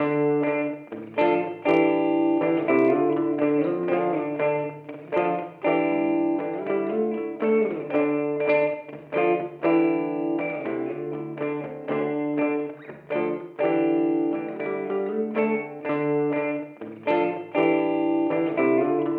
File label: Shure SM57 virtual; Torpedo CAB M